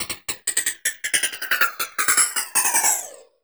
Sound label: LOOPS